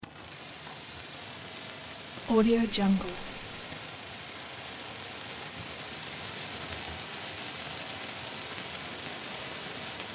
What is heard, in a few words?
welding